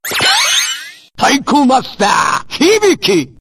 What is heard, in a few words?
taiko; master hibiki